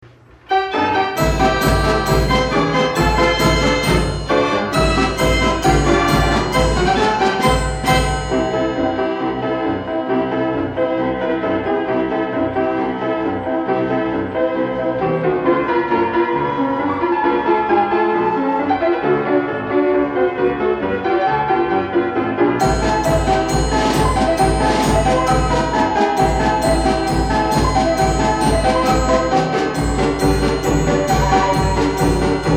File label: dixie sample, Band Organ